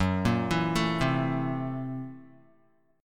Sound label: Gb 7th Sharp 9th Flat 5th Gb Guitar; arpeggiated; Gb7#9b5; 2,1,2,2,0,x; JGuitar